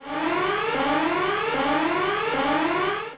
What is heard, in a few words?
Combat warning; Starbound II